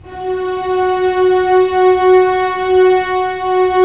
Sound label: STR SEC, Roland LA Composer, Sec Mute St